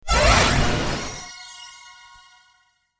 hit; cstrike; war3source; holyknight; god

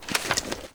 scarh foley; garrysmod

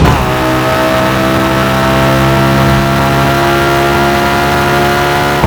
Third, Caterham, vehicles; garrysmod

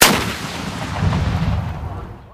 rpg launch; garrysmod; sweps

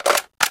g3sg1 clip in, weapons; garrysmod

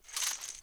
belt pull, weapons mg; garrysmod; vollmer

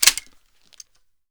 m249 lid close; mg; garrysmod

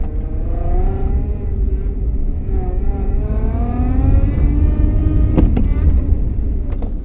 car reverse; vehicles; garrysmod; sincar

